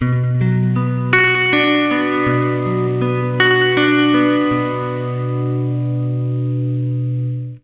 80 MIN ARP B, Acoustic Guitar Loops; USB Soundscan vol.04 - Electric & Acoustic Guitar Loops; AKAI

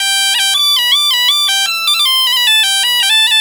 BP4, Celtic Flavours, BAGPIP; USB Soundscan vol.42; AKAI

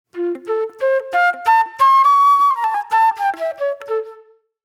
Reflective Flute; Sonic Foundry (Sony Creative Software); Manhattan Flutes

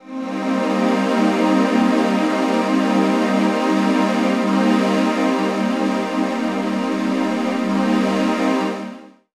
Phat Choir, Pads; Jungle; Jungle Warfare 1&2

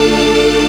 syn a, Dance Synths Stabs & Hooks; Best Service ProSamples vol.37

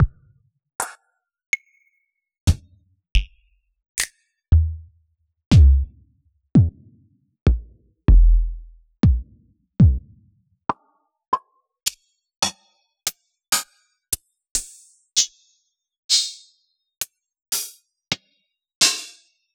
Yamaha DJX DJX Kit

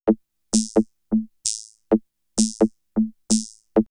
Vocoder Loop; X-Static Goldmine; VocoderLoops

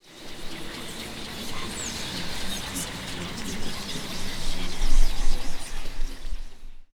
CHATTER

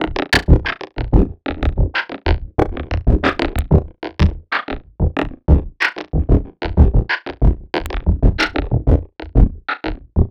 Dirt Bublz, Drum; Sonic Foundry (Sony Creative Software) - Future Retro Dance Excursions

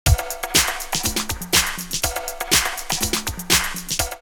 Conga Break 122, drumloops Techno House; X-Static Goldmine

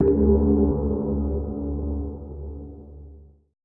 GONG MUTE, TIBET; Best Service Advanced Media Trax Modern Composer; ETH TIBET

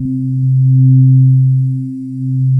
GLOWY ORGS, pads; Pro-Rec - Synthsonic

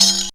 FAT TAMB, perc; Zero-G; Funky Elements

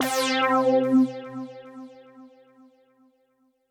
Synth C, Synth Prophet One Shots; Electronisounds

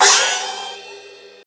CYMBIRD; USB Soundscan vol.33; Disjointed Textures; AKAI

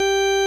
ORG VOX G, Continental

